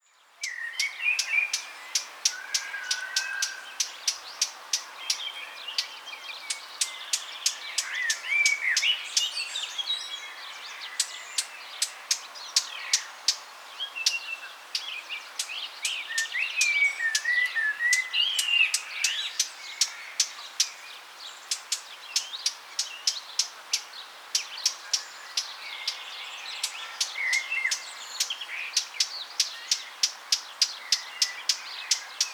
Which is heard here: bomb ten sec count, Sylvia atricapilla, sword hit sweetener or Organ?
Sylvia atricapilla